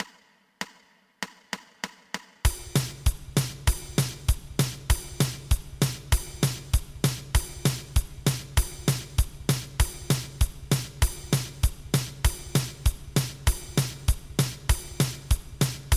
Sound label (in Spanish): 2 pulsos